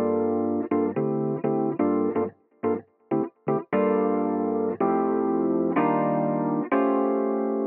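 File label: electric piano, 125bpm Dm, Nu Disco; Zero-G; Construction Kits; Speedy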